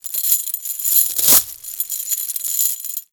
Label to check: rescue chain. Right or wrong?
Right